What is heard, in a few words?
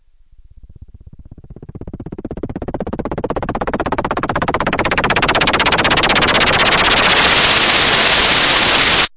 noise MiniBrute, Noise Sweeps